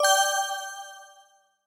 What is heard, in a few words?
Spell